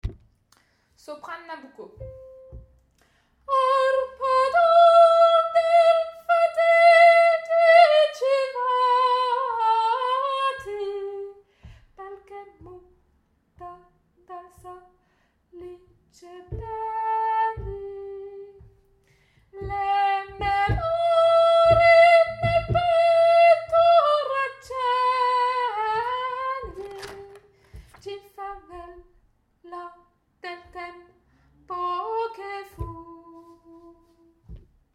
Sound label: sop nabucco